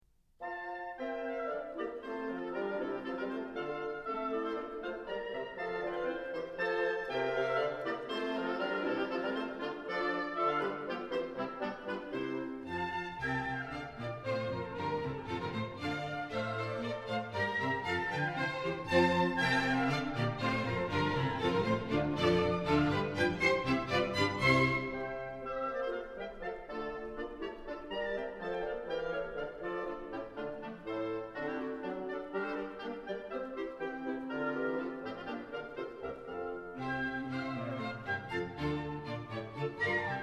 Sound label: hornpipe